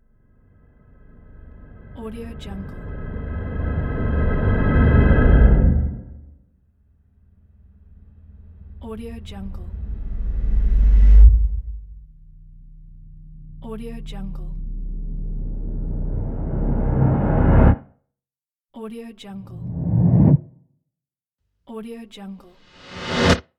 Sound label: riser